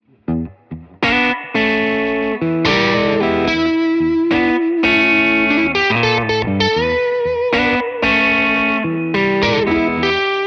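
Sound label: blues Stratocaster; poulin; legion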